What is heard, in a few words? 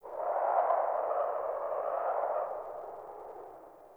Wind Gust Howling Low, Venus Landscape; Warframe; Venus Open World; Ambience